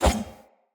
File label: Short Sword Whoosh; Weapons